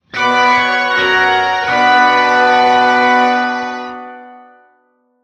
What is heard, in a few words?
stinger loot box epic; heroes of the storm full gamerip 2015; hallowsend